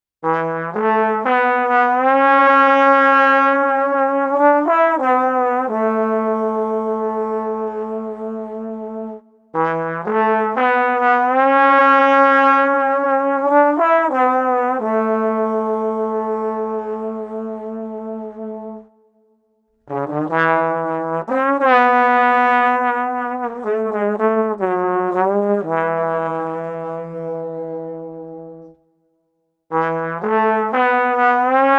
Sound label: Street Trombone Drunken 100